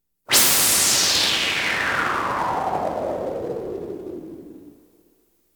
Science Fiction effects, Lasers, Light beams

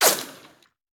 Bullet Pass By; Sound Ideas; Guns

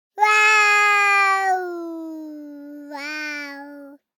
BABIES TALK Boy 12 months Wowwow; Baby Boom

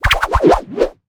Swirl, Comical Effects; 1001 Sound Effects

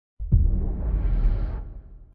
Focus Exit; Pitch Black - A Detective's Demise

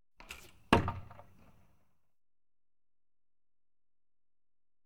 Arrow Shot Into Wood; KMP Film Video Sound Effects

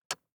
DICE on Glass, Grab, Standard, 5 Five Dice; Samples; ArticulatedSounds; DICE Sfx Party (Extended)

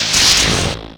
Pickup Lightning Shield; Pickups

